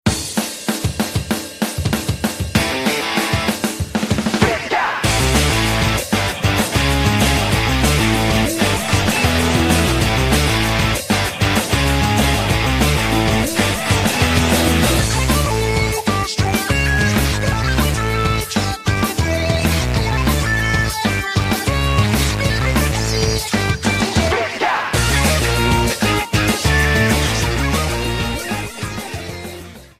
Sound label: music; MK8D; Urchin Underpass